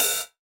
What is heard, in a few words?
hihat, Hardcore Breakbeat, 145 Ebmin; Big Fish Audio; Hardcore Breakbeat Action; Riddim